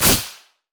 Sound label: shadowswing; zed shadow